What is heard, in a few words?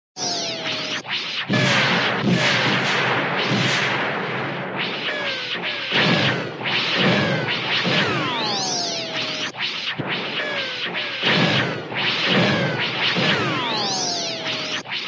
sci fi, laser battle; gobot